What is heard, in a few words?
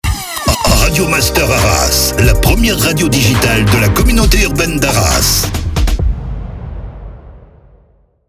Jingle SPEED; Radio Master Arras; Habillage